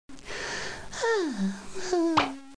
bath

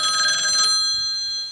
phone; PUZMANIA

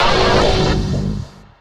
soul pain; darwinia

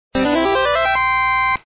game clear; POKEMON

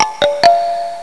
Three Note Reminder; Magnet 1.0b27

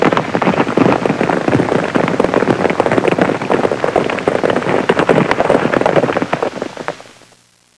horses galloping; Sound Effects for Multimedia - Volume 1

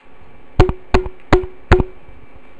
Infantry walk; Macworld (1997-09); Games World; Imperialism Demo; Tactical

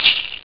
shaker, drums; OctaMED SoundStudio V1

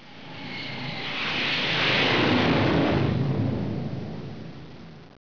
Fly Past; F/A-18 Hornet 2.0 Demo

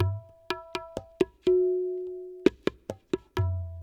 PERC LOOP ETHNO 125

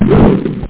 burp

